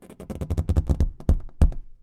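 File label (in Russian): ras, zvuk rastiagivaniia, stretching sound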